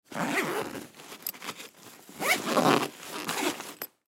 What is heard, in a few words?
Lacrosse Equipment; Bag Side Pocket Zip; Sports; Blastwave